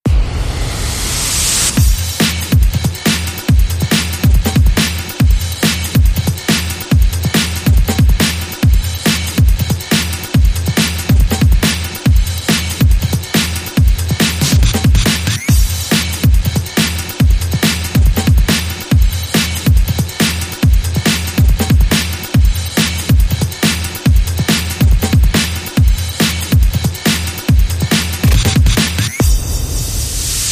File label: Cancellation Beat